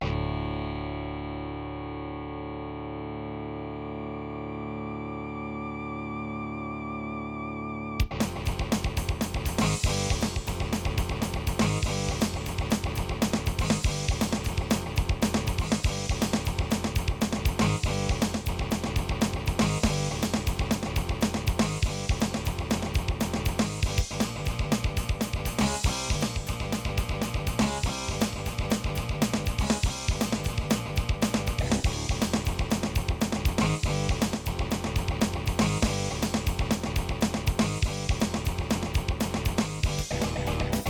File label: korg ag10; soundcard test; Doom